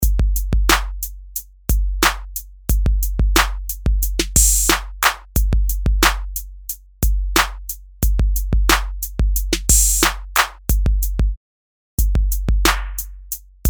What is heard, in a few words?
drum machine claps; cheesy BBD Reverb; reFUSE Software Cheeseverb